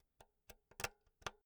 insert jack cable slow

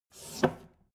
take book

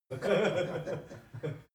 laugh male short

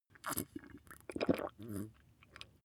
glass bottle drink swallow

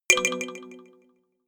notification kalimba delay fourth

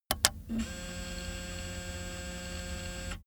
player car eject

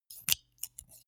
scissors open close soft

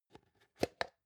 plastic can small lid open soft

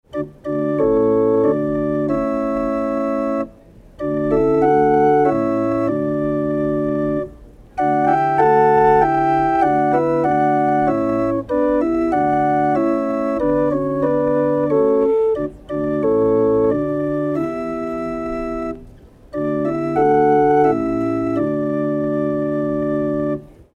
hurdy-gurdy

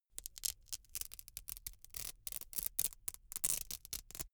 velcro open cap really slow

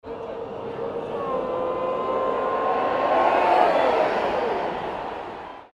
laola wave

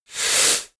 inhale male

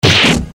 eastern fist hit seq

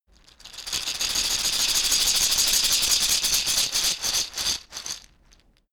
shaker wood rough rattle dynamic

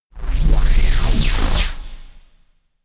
whooshing bass drop short

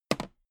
toolbox handle bounce heavy

